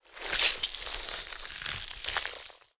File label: worm strafe, giant worm